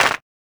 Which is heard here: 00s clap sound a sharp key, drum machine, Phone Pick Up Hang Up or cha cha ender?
00s clap sound a sharp key